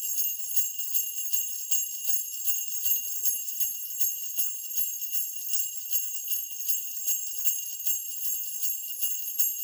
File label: jingle bells